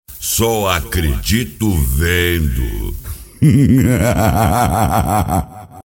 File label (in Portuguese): hahahah